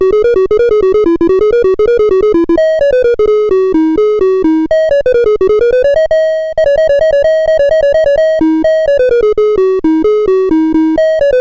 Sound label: sid CSG8580R5